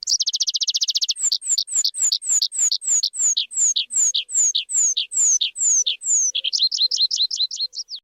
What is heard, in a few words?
Richard's pipit; پیپت پا دراز